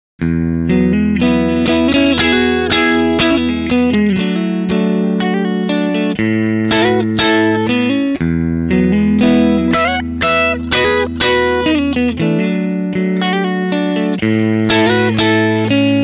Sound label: AC30, gitar